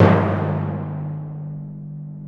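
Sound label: timpani hit; Digital Sound Factory Virtuoso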